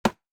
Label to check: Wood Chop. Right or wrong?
Right